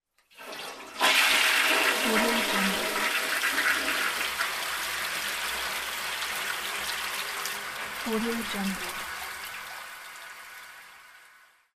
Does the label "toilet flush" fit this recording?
Yes